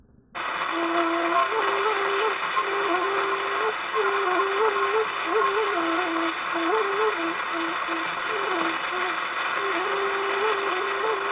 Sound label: Nose Flute, Hawaiian; World Music; Na Leo Hawai'i Kahiko - Voices of Old Hawai'i